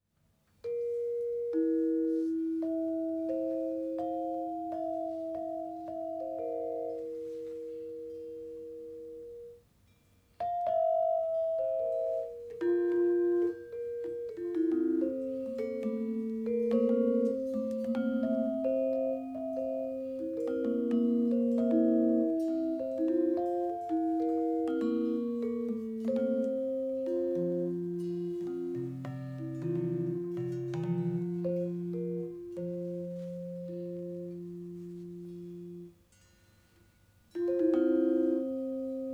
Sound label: gender, gamelan